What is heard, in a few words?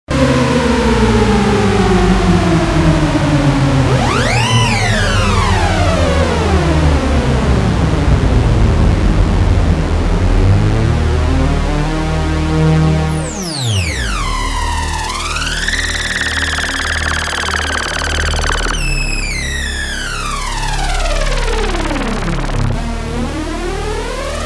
unisono mod